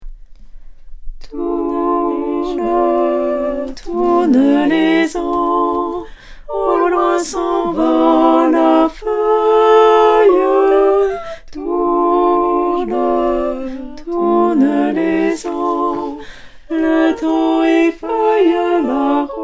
Alto

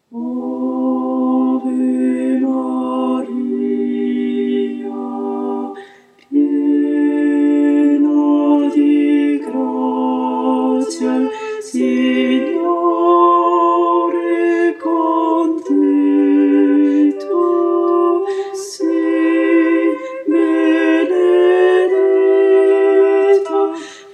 alto

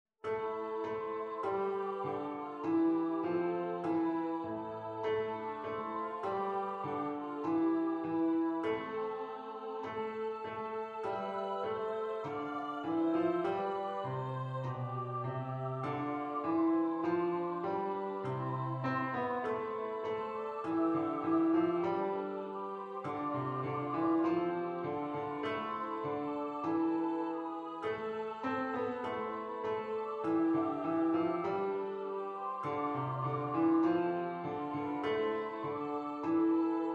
Tenor Basses Piano; En Gardant ma bergerie

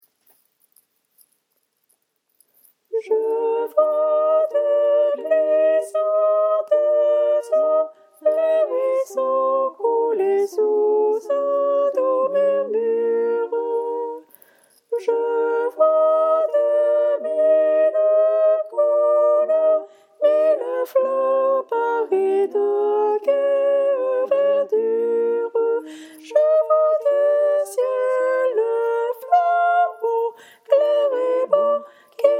tenor; Je vois des glissantes eaux

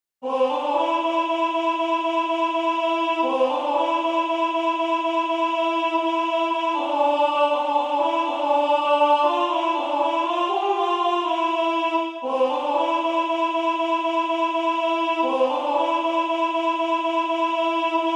alto

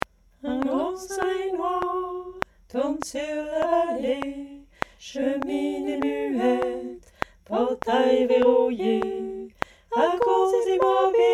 tutti

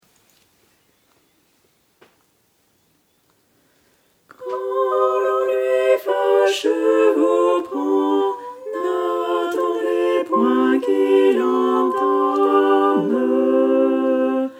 tutti; Quand l'ennuie facheux vous prend

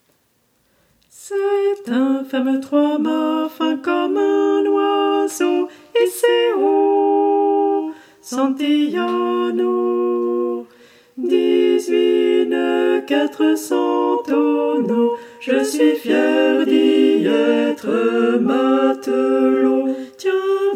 santiano tutti

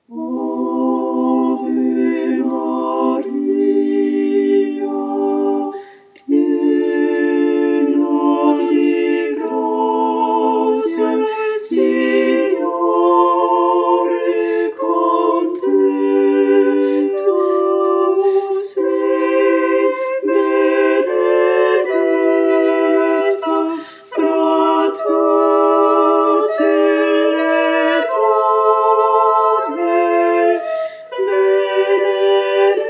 tutti; ave maria; Pepi de Marzi

